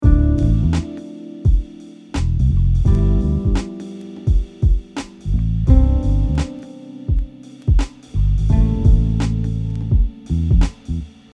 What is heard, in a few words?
targeting roots chord tones, Targeting root notes example, guitar